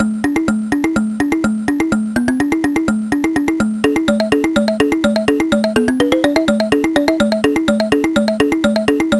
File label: marimba; MusicLine